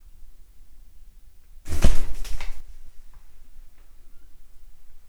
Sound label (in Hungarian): tigrispiton hátraugrik a zófelé je közelítő nyúl elől; állathangok; miskolci zoo 2009